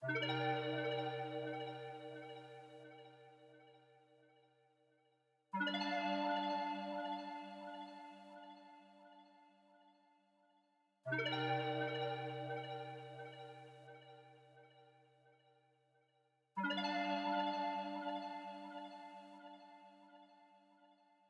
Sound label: 174 BPM G Min Arp; RnB; Loop Stems; Cymatics; 2022 Melody Collection; RnB Pt 2; No Way Home